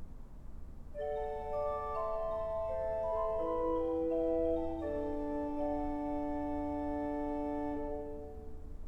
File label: koppelflote, organs